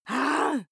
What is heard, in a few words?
female groan angry; koerpergeraeusche; menschen; soundpool